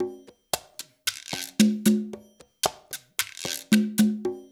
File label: LOOP, Percussion; InVision Interactive; Percussion Slam; ITCHY